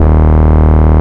Root D#, Bass

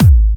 Hard Kicks; Vengeance Samples

